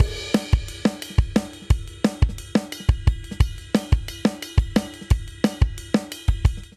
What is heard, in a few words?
Straight Five Rock Chorus 5/4 177 bpm, Drum; Ableton; Factory Packs; Drum Booth